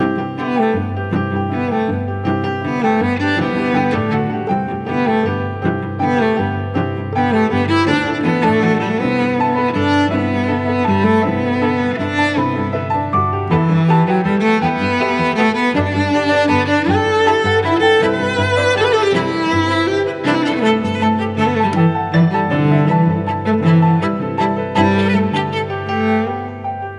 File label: Music Level Bubbles Layer Mix